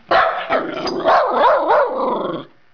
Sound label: groo, eskie; Peppermint